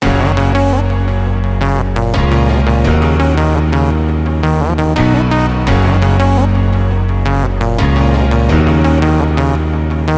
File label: Samsung Galaxy Ringtone